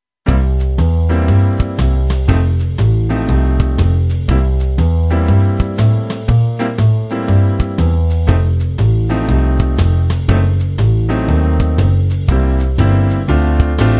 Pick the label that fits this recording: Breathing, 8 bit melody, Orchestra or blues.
blues